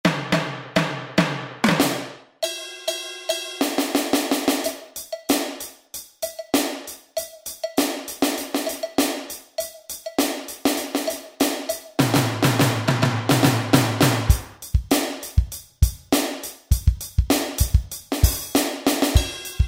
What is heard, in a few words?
Funk Kit, Kurzweil K2000; Kurzweil K2000 Orchestral ROM